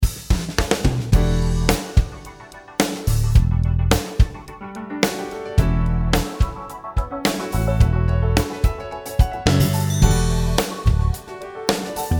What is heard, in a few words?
Backbeat; Pull Up